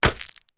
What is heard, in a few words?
Flash Bulb Pops Poofs